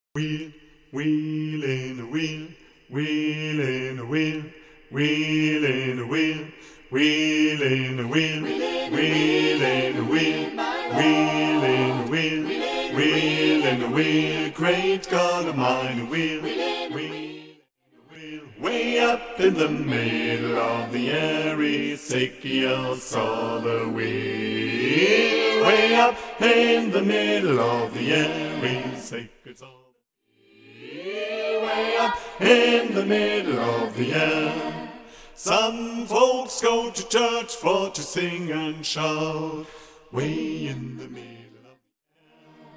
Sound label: BASS; Ezekiel saw the wheel